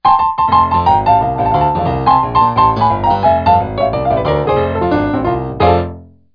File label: Piano Interlude; Lego Island